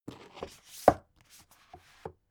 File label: book shelve soft long; haus garten; wohnen